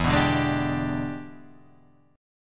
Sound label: E 7th Sharp 9th, chord; E7#9 Chord {0 2 0 1 3 3} strummed